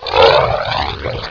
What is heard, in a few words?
gurp; quake2; ontra